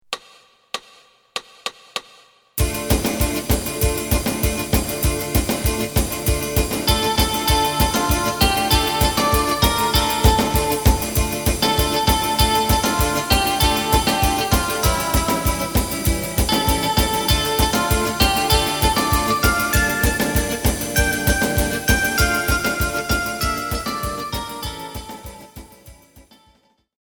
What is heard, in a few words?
bass